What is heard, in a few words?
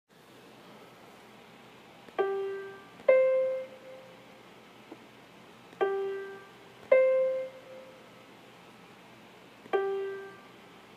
Interval